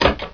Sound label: SWITCH